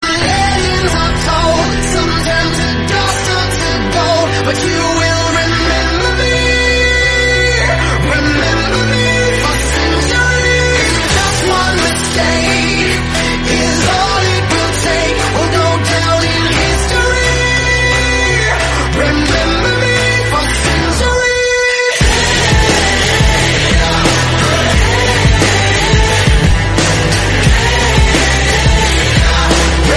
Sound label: english